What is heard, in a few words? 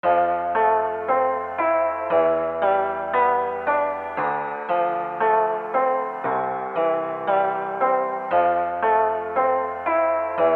LOFI CHORD